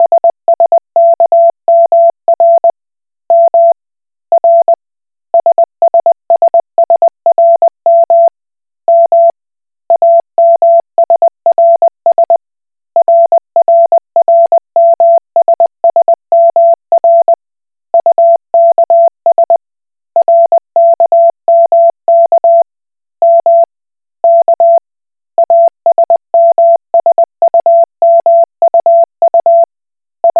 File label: Koch Morse